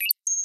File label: Multiple UI Beeps